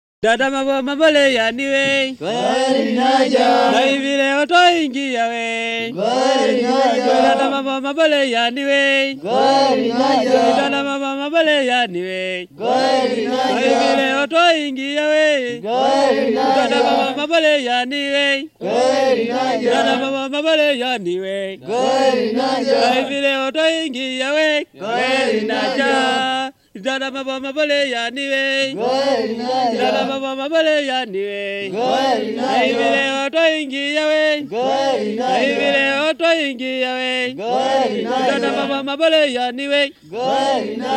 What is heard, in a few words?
African Natives Sing